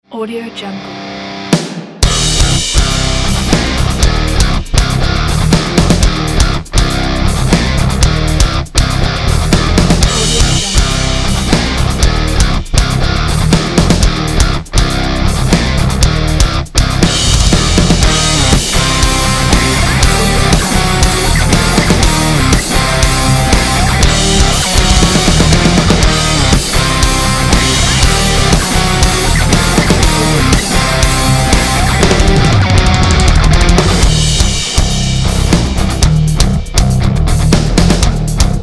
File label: heavy metal extreme sport